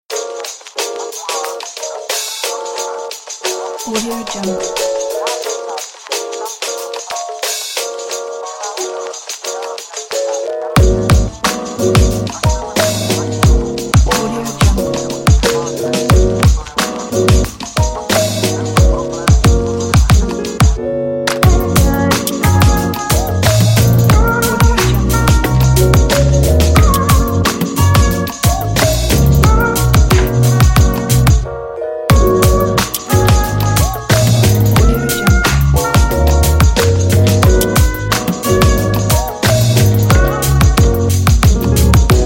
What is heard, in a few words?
lofi vlog beat; vistula-waves